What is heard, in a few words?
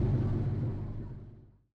stop, vehicles; silverado